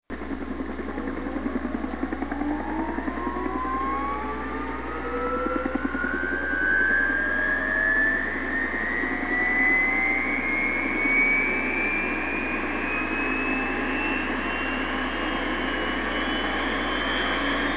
Fireup, aircraft sounds

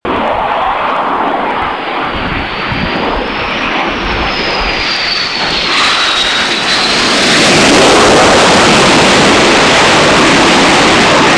Hornet, aircraft sounds; March Aviation Society; Airfest; March ARB

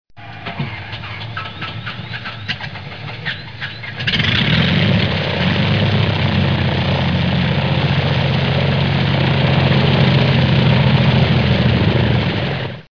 Starting, aircraft sounds

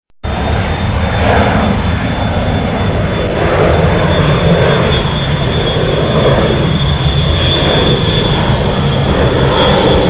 Jetpass, aircraft sounds

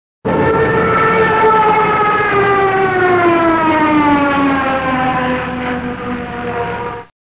Stuka, aircraft sounds

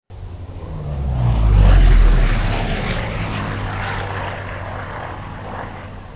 Bear, aircraft sounds

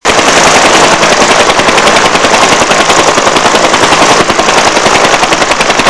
Huey, aircraft sounds

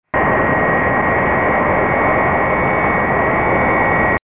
Jet, aircraft sounds

